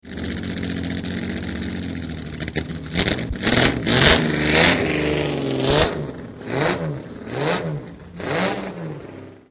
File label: ferrari